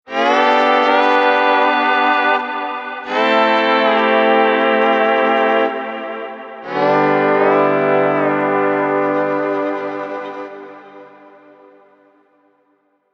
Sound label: Pedal Steel